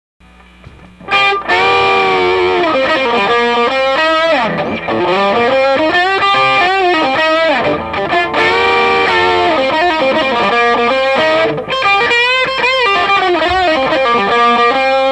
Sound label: fire rear; FIREBIRDリアピックアップ; Monkey Drive